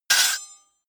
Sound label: hit metal sword small